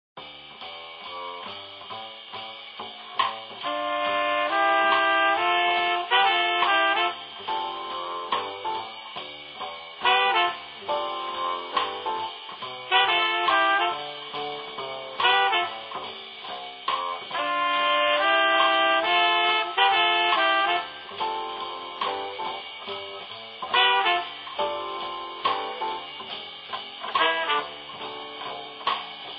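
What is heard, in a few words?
Music Ambient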